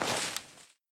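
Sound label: foliage footstep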